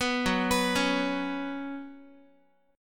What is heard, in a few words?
F sharp Suspended 4th, Guitar; arpeggiated; 14,14,14,11,x,x